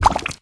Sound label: cookie dunk; Candy Crush Friends